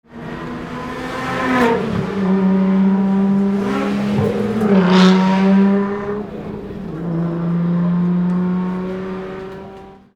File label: beetle